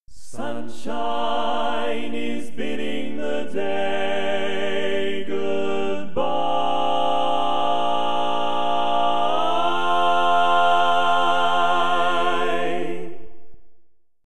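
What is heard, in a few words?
barbershop tags, baritone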